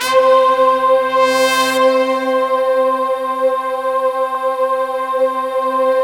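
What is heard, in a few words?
brass, slow brass choir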